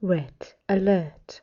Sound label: Red Alert